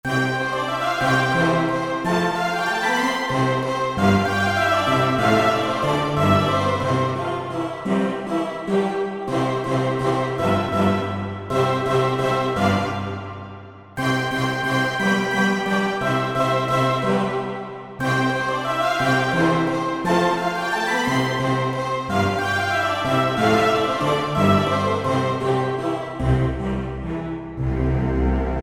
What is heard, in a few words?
Classical Choir & Strings; synthesizer; Guess the synthesizer game